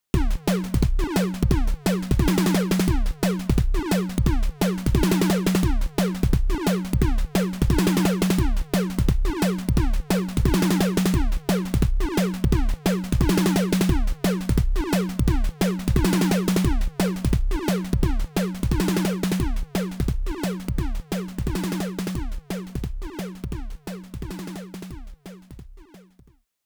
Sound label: Get a Weapon; Mega Man The Wily Wars; The 16bit Audiophile Project; Genesis